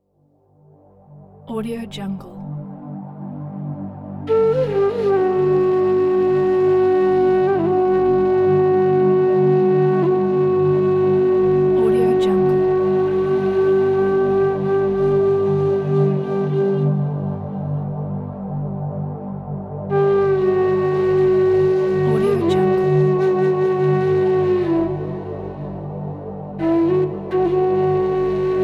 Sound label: meditative indian flute soundscape pack